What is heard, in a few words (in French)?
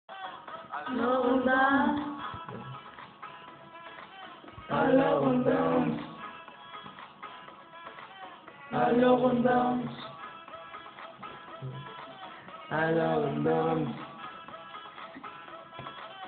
chanson